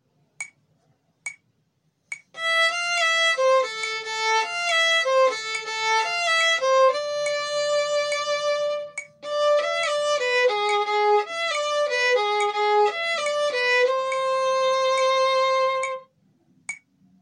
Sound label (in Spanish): Extracto A Lento con metronomo, cumbia